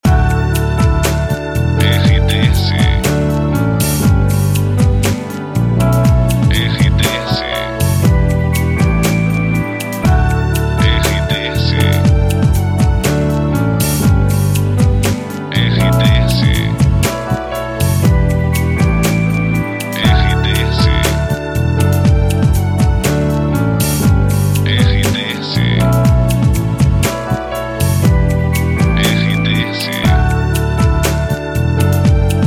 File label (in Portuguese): TRILHA PARA LOCUCAO; Pacote Web Net; Web Rádios